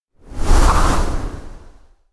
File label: elec whoosh thick branch fly; anemoi